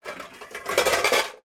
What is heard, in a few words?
household pots pans heavy movement